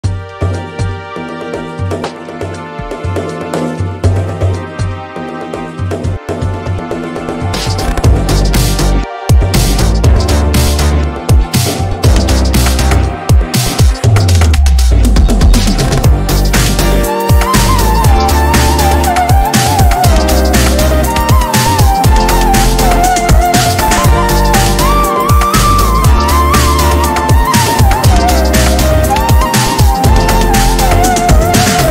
fon muzik remix no vocal

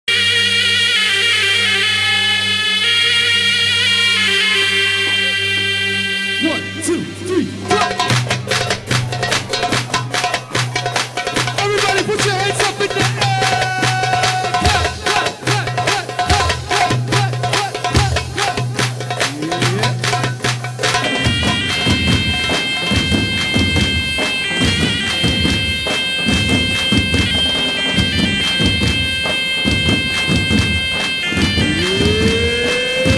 zaffe davul sov, amazing turkish zaffe show; turkish zaffe bride and groom

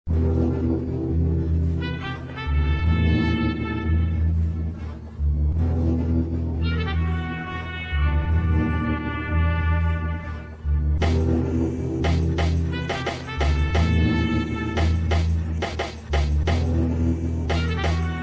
turkce rap; brawl stars sarkisi

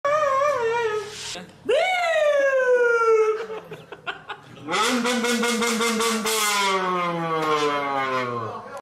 egzoz sesi; adem reis; warex

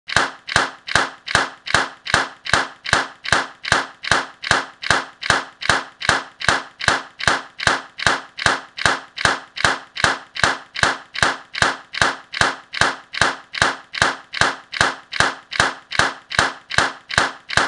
windows acilma sesi; ses efekti

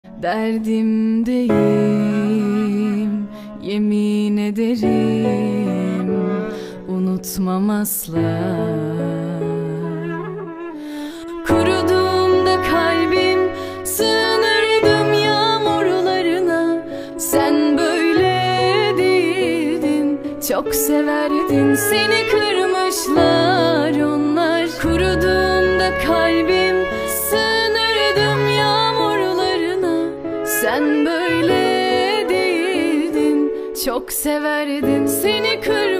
akustik

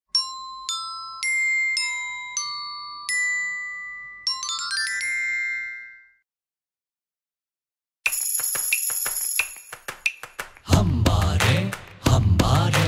beden perkusyonu ritim oyunu; hambare; okul oncesi sarkilar

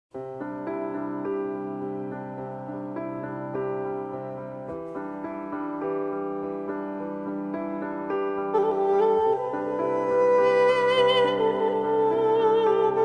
fon muzigi giris kismi efektsiz uzun; cati kati

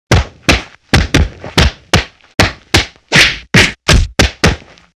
yumruk ses efekti coklu yumruk sesi

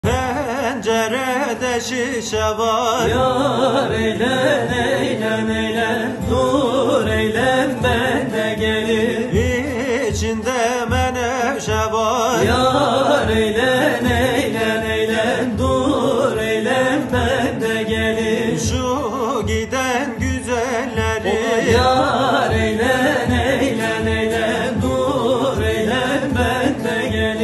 koy turkuleri halaylar

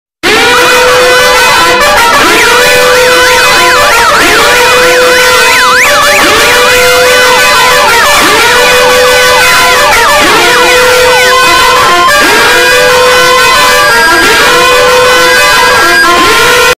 yüksek sesli bir alarm sesi

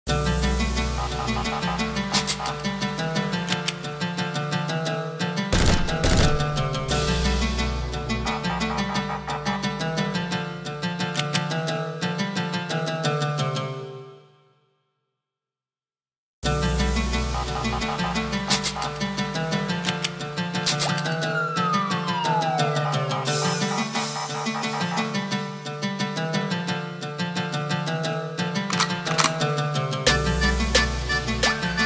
zurna mafya muzigi sirenli silah; the mafia; prod muhammed turk

